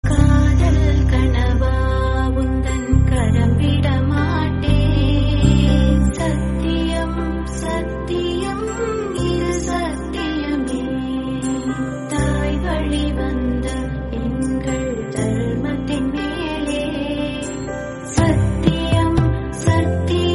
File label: Beautiful Music